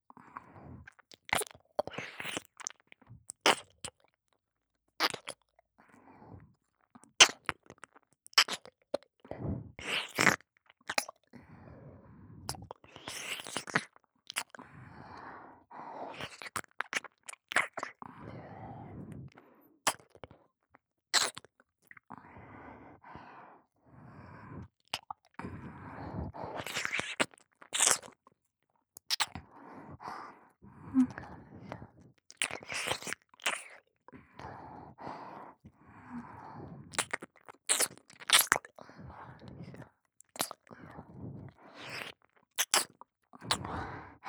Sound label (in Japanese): 左接近・耳舐め・普通; リーシャ